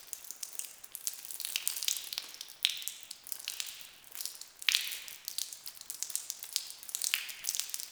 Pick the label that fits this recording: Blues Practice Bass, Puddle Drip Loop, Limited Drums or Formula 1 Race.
Puddle Drip Loop